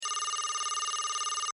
money tally; Skillzgaming; mega Money Rush Desktop

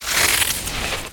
ball; adelia The Fortune Wielder; Foxium

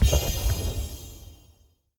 blast special; Skillzgaming; gems odyssey 92 desktop

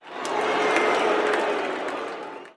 Negative; genesis; euro Golden Cup Desktop

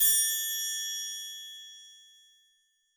Fight Sequence Metal; Wu Xing; genesis

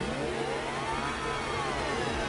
Reel Spin Loop; Halloween

